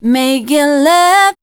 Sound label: voice spectral, ly short